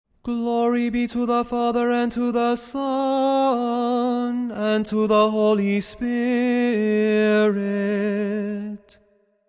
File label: Tone 8 glory be